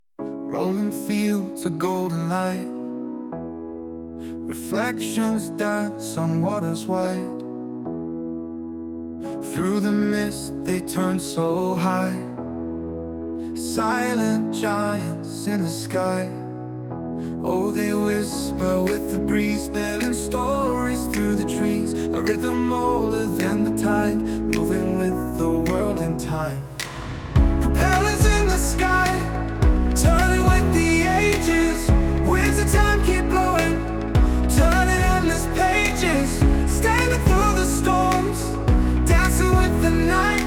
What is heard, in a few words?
energetic upbeat action sports